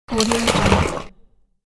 box of toys noise

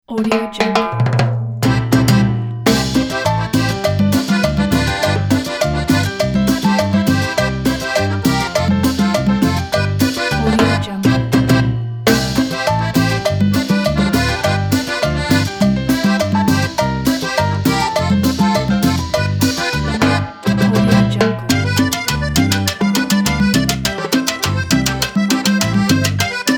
party latin cumbia

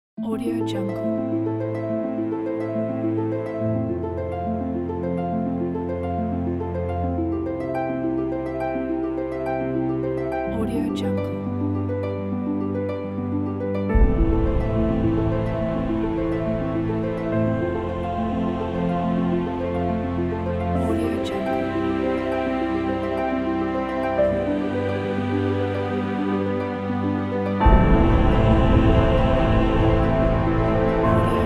evolution

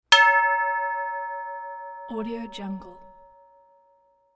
monk bell; electronplanet

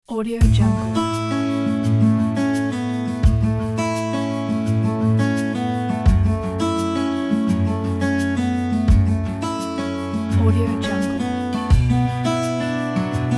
positive relaxing guitars; musicdoctor

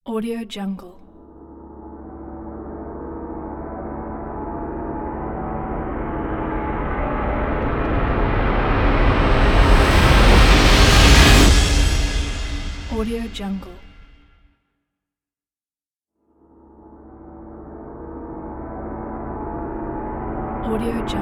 cinematic rise trailer

